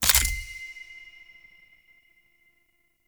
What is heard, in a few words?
Collectible Fragment Collect; Warframe; Duviri